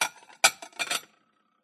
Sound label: household kitchen plate cupboard put away clatter